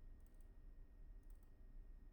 mouse; IEEE AASP

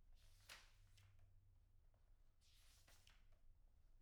pageturn; IEEE AASP

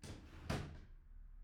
drawer; IEEE AASP

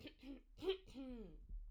clear throat; IEEE AASP

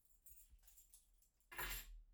keys; IEEE AASP

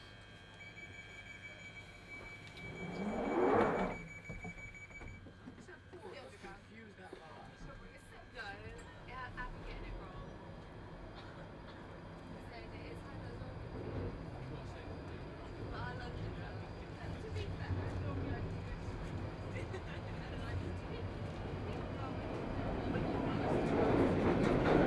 tube, scenes; IEEE AASP

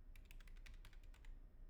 keyboard; IEEE AASP

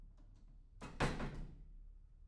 door slam; IEEE AASP

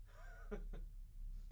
laughter; IEEE AASP

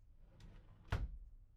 drawer; IEEE AASP

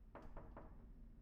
knock; IEEE AASP

